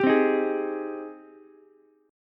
G sharp Major 7th G sharp, Ukulele; JGuitar

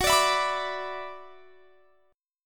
Gb 7th Suspended 4th Sharp 5th Gb; Gb7sus4#5 strummed

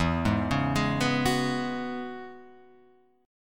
E 6th Add 9th E down Guitar Half Step Down

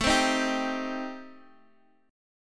A Minor 7th Flat 5th A, Guitar, DADGAD

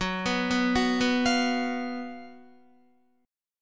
Gb Major 7th Suspended 4th Gb, Guitar; GbM7sus4; arpeggiated; 13,14,11,11,11,13; JGuitar

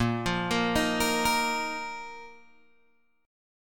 Bb Suspended 4th, Bb, down, Guitar, Open G

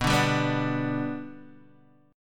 B Minor Sharp 5th, Guitar, Open D

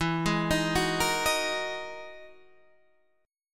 E Major Sharp 11th E

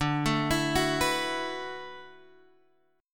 D 6th Add 9th, Guitar, Open G

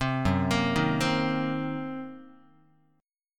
Gb 6th Flat 5th Gb down Guitar; Listen to Gb6b5 arpeggiated

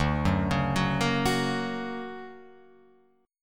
D Augmented Major 7th down Guitar Open F; D+M7 arpeggiated; JGuitar

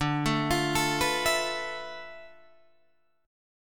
D Minor 6th Add 9th, Guitar, DADGAD

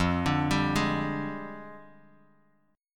F Minor 6th Add 9th F down Bass Standard; JGuitar

